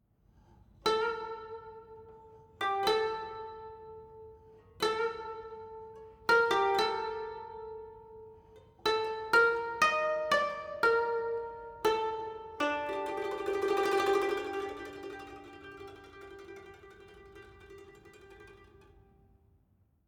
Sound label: Savannah Music; kenshi windows gamerip 2018